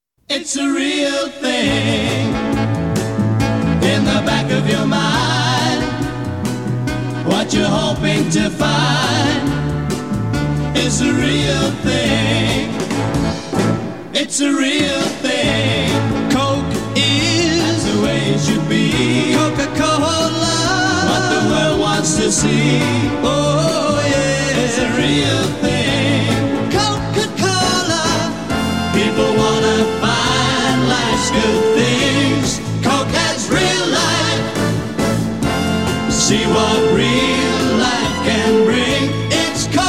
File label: Jingle